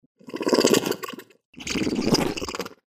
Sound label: prop defensive, lemur; P. coquereli; The Audible Phylogeny of Lemurs